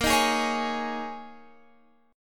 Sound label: G sharp Suspended 2nd Suspended 4th, guitar chord; x,13,13,13,14,16